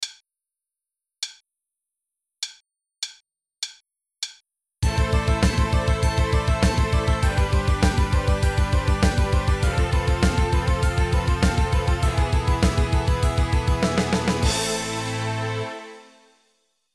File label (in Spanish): Tapping media